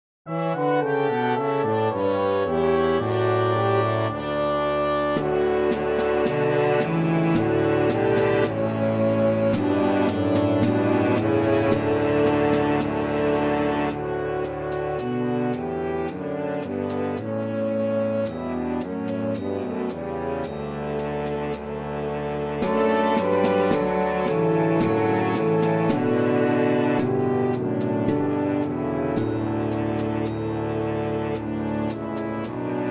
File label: track with Melody; Good King Wenceslas